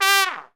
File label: FAST FALLS; E-MU Formula 4000 Series Vol. 5; Protozoa; Proteus1 Instrmt